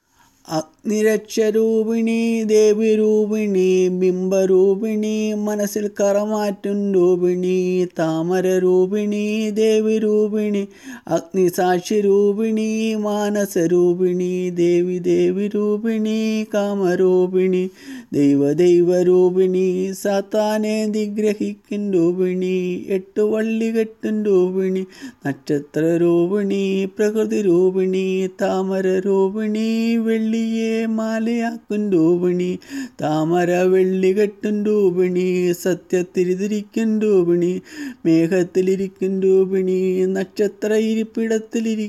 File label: lakshmi chant; ലക്ഷ്മി കീര്‍ത്തനം